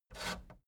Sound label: fader plastic scrape single up halfway fast